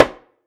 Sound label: udo slap ff, hand drums; SoundBytes - Early Patches